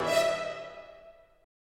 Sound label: HIT